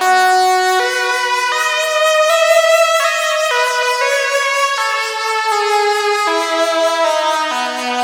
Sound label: xtra lead